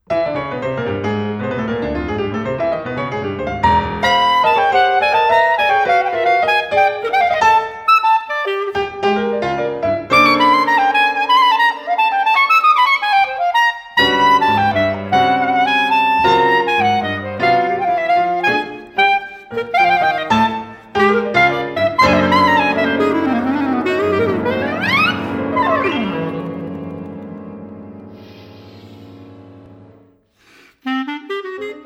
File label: Quintet